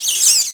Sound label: revert glass; skater; Tony Hawk's Project 8; XBOX